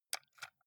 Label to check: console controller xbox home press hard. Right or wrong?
Right